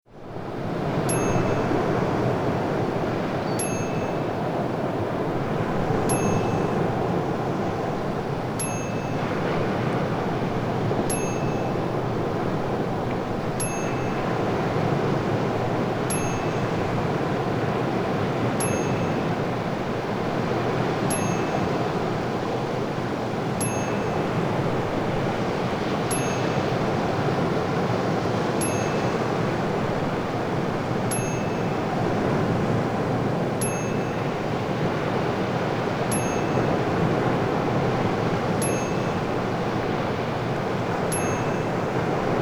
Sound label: Waves Crashing in the Surf Seagulls Calming Sounds